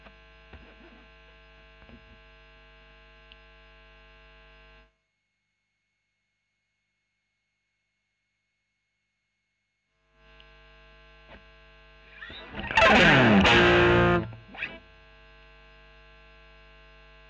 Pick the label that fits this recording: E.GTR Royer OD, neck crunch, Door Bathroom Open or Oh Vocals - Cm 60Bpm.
E.GTR Royer OD